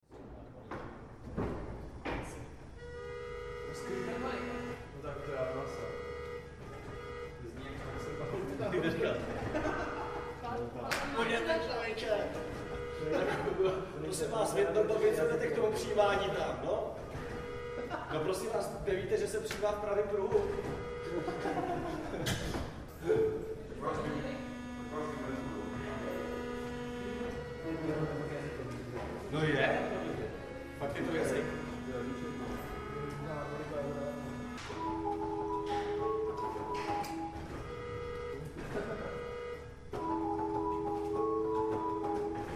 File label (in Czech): jazýčkový rejstřík harmonika, varhany